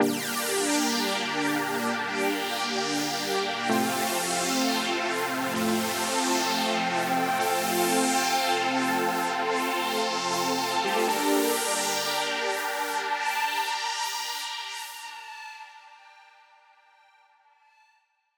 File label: 130 BPM G Min Synth Chords, Melody Loops; Synth Collection; Cymatics; ANALOG EVOLUTION Beta